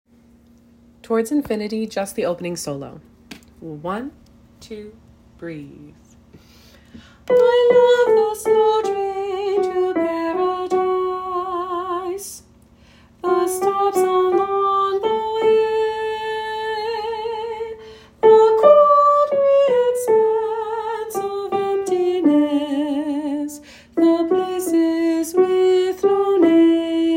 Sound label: solo